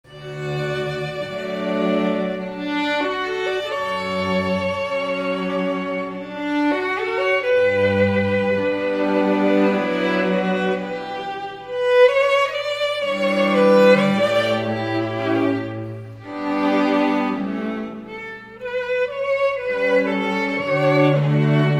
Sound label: Valse Musette; Puccini; Rhapsodie